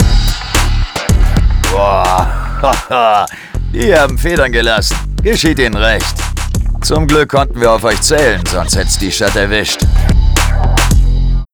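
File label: Outro Victory German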